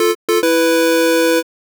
jingle clear

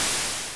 hit

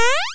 jump

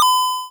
item get

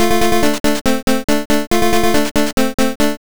jingle china

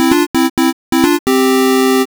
jingle interval

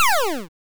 power down

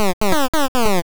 jingle die

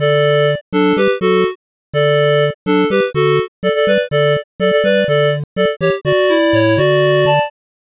jingle classic hallelujah; handel

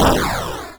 hit

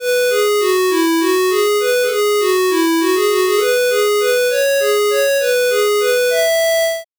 jingle classic grieg peer gynt asa

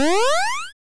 jump

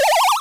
power up

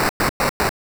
jingle stairs

